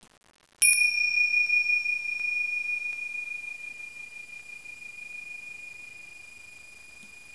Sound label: bells